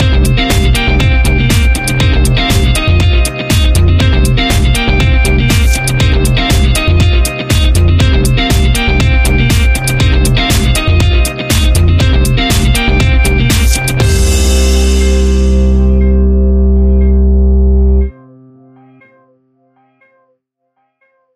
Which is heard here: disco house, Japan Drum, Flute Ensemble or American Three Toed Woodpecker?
disco house